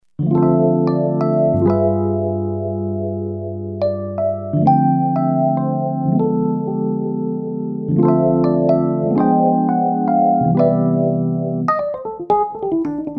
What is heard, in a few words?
Taxi EP; Roland SRX-03 Studio SRX